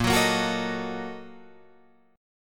Bb-Major 7th Suspended 4th Sharp 5th, Guitar, Open F; strummed